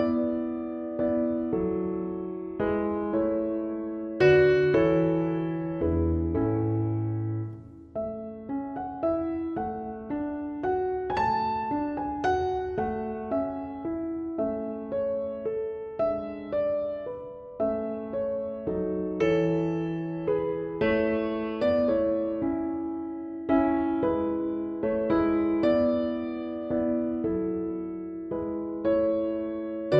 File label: piano sonata variation; op kv311; wolfgang amadeus mozart